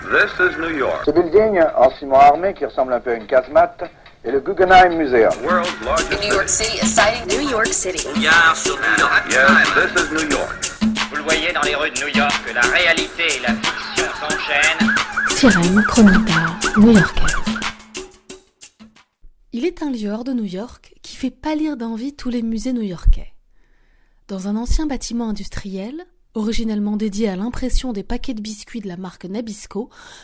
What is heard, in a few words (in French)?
sirenes